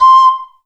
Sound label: Yamaha TG500 WN Recor C6